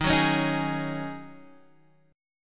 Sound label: Eb Minor 11th Eb down Guitar Half Step Down